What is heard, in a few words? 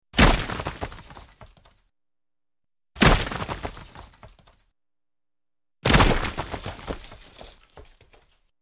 Big Rock Hits 3x; rocks